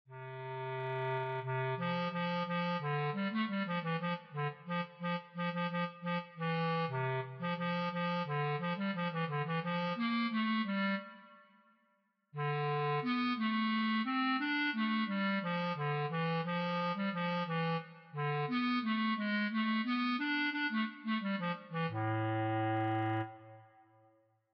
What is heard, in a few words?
Bass Clarinet; 吹奏楽; R6 吹奏楽部 卒部式